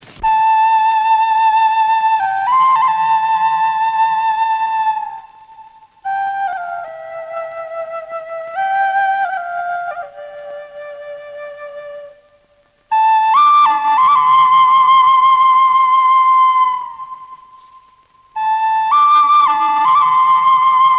Flute; Yodha; Malayalam Ringtones

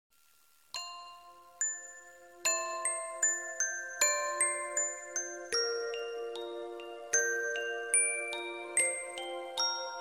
Baby Sleep; Children; Ringtones

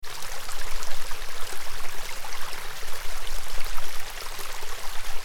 creek; Water; Ringtones

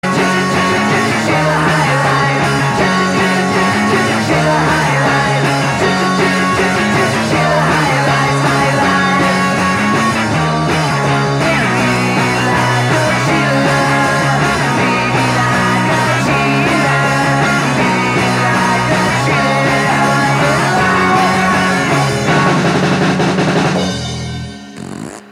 awesome chiller high life ringtone; Australian Ringtones

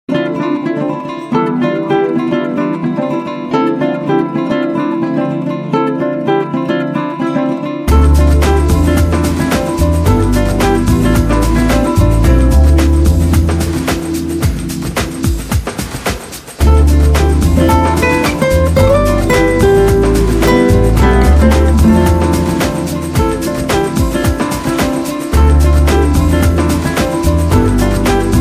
Ambient Chillout; Ringtones

